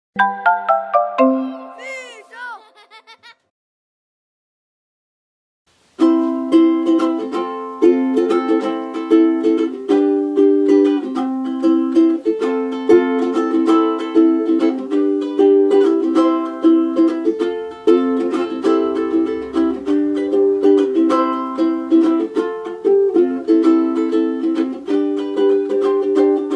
ukulele

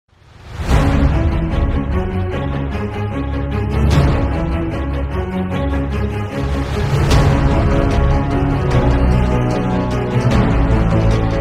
Powerful Background Music Intro Epic Trailers

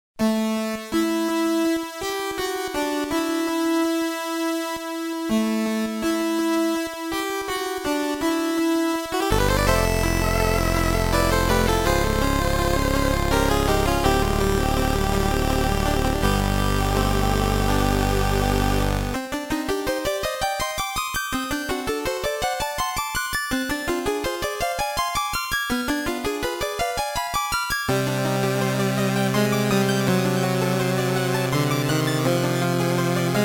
FC VRC6 Prelude Introduction; Akumajou Densetsu

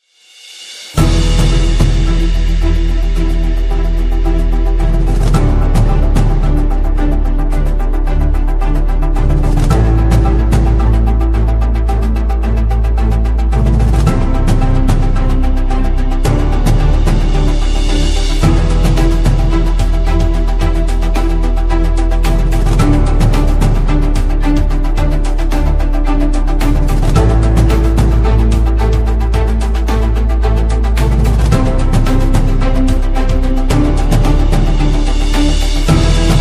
Adventure Music

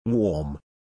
warm

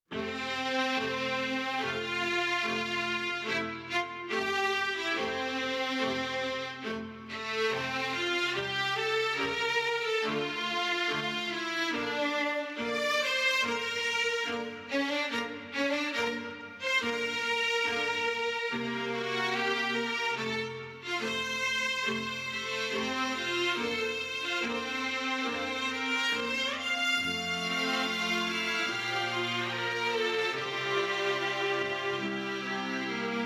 MUSIC CLASIC

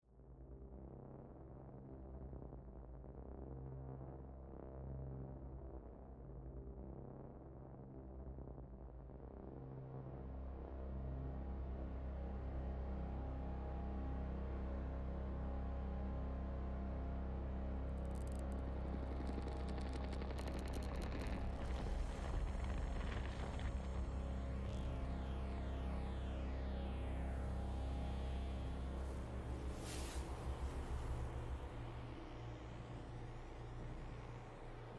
cinematic dark sound effects dark ambient synth; ambient sample pack; ambiences

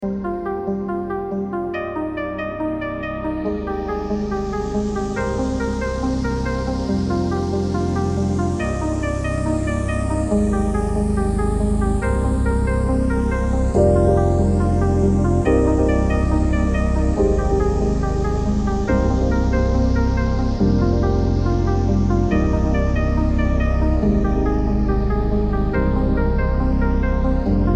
piano arp

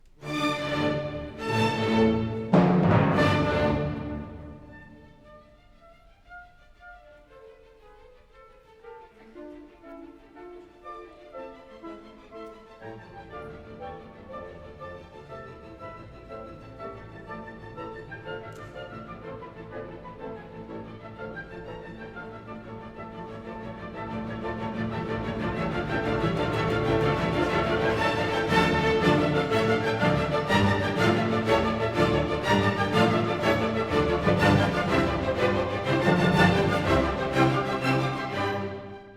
Symphony Orchestra; Beethoven Symphony No. 9 Mvt. 2; Barenboim West-Eastern Divan Orchestra

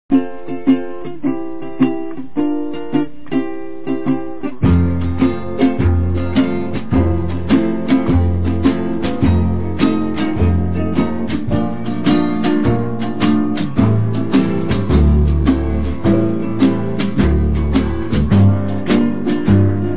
Instrumental

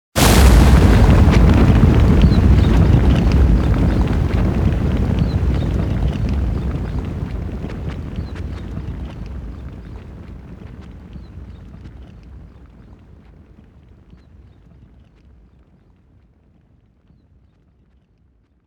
landslide

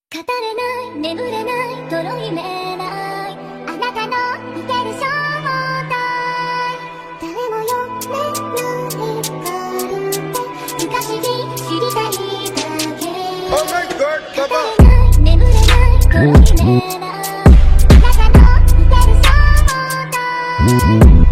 Drill Remix; TikTok Version

